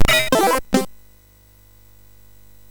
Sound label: Jingles, MOS6581R3; hvsc